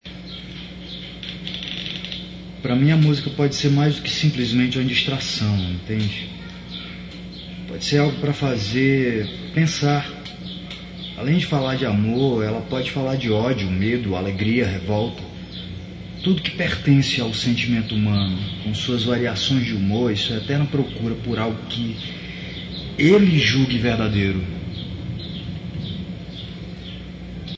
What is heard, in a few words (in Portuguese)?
musica